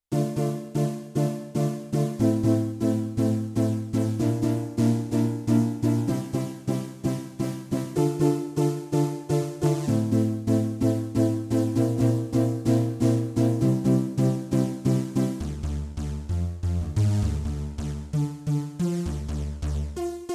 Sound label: LA Supersaw, Roland VC-1